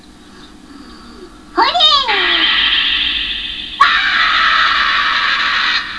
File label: scream; Nuriko screaming after Miaka shines the flashlight in his eyes.